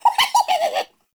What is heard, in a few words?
Trapped Sprout Falling Vocal; Characters; Friendly; Sproutfolk; Fey Hub Sprout